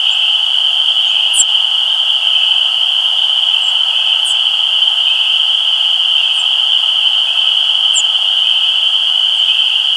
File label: Sparrows Passing